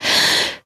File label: Siren Prepare Scream; zombie plague